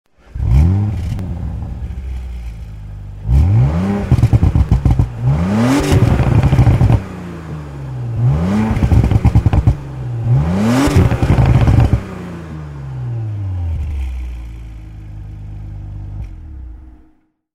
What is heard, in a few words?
audi rs5 motor sound